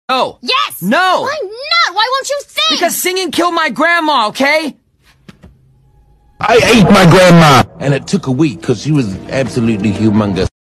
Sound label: singing